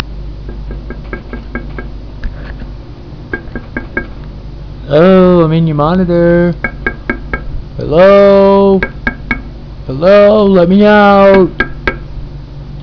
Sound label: tap tap